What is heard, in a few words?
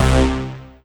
Synth Hit C